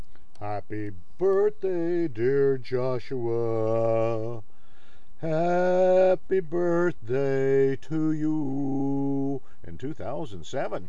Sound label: birthday singing